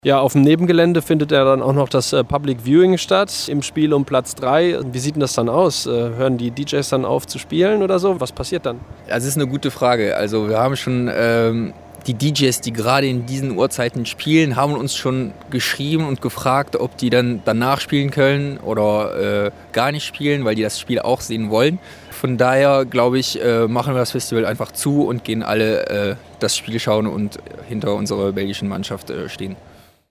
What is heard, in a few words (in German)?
Public Viewing; Trakasspa